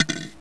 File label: shredder; pathological